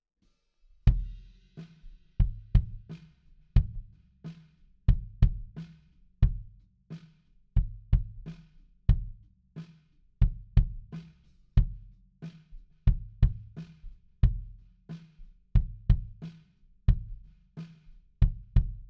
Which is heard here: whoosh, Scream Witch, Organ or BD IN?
BD IN